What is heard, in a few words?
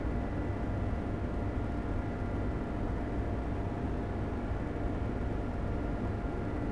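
warehouse interior ambience; Star Wars Obi Wan; XBOX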